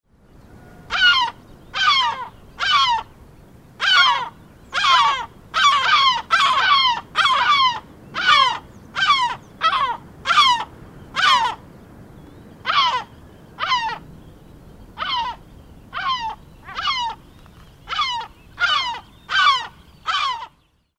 yellow legged gull

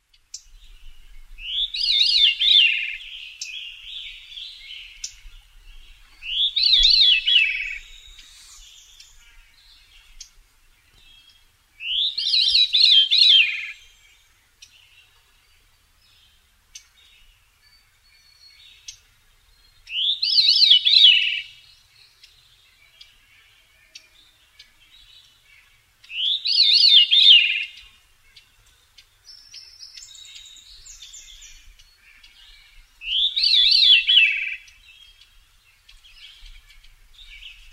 veery song

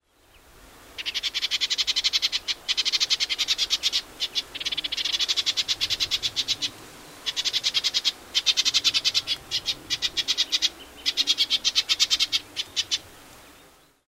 melodious warbler

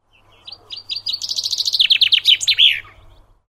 chaffinch

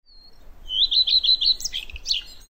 redstart

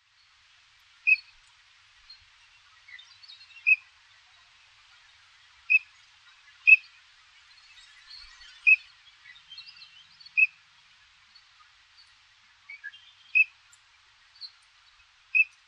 green winged teal

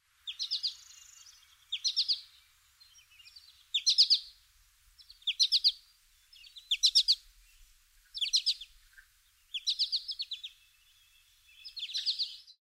american goldfinch

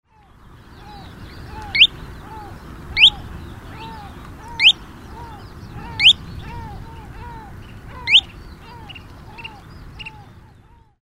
kentish plover

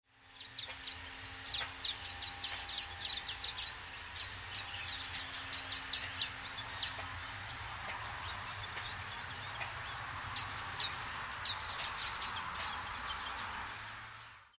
common waxbill

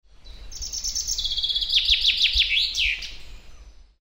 chaffinch bialowieza

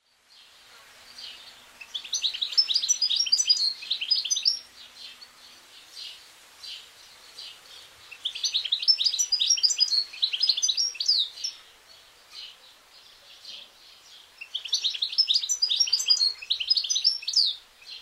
goldfinch

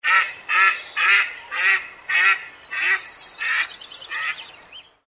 gadwall

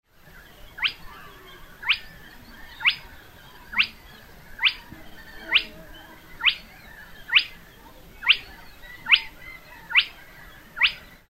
spotted crake; parikkala

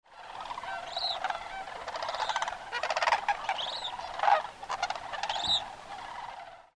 sandhill crane

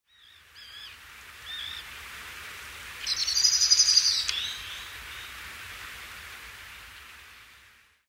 blackbird, nocmig

